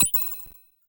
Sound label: Button Press